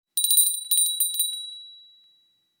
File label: Small silver steel handbell ringing